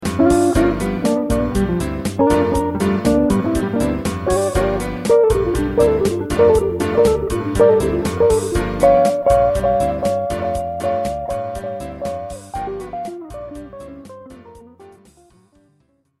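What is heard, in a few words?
double stop fade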